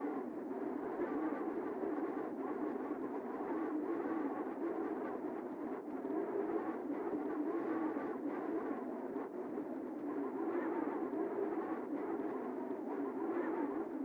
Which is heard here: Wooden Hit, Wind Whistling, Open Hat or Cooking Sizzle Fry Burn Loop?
Wind Whistling